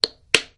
cuarto de bano

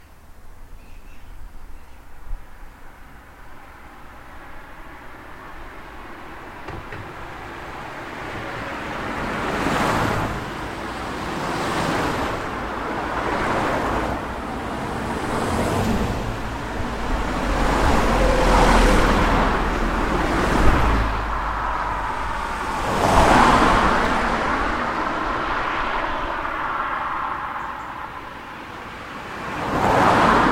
aves

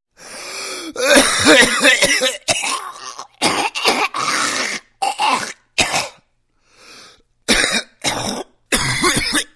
gargajo

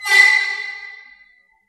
la friccion

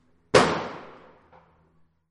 el dub

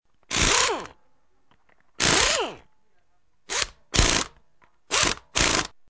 atornilladora electrica

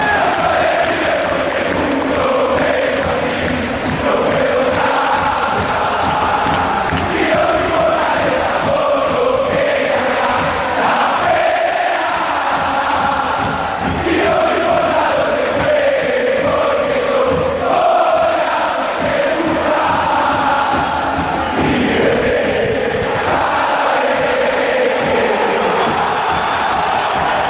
soy de river plate

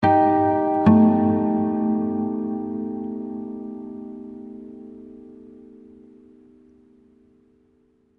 acusticos

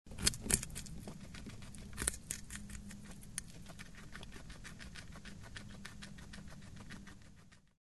cobaya

